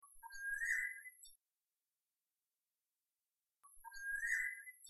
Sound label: oriole call